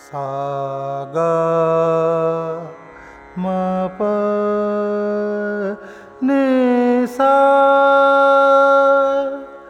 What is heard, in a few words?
aroh, dhanasri